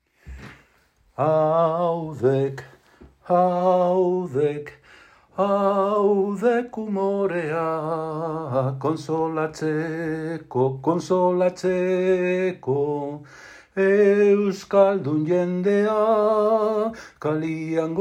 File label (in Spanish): Juego euskera Kalean gora